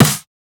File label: Snare, Psytrance; One Shots; Claps Snares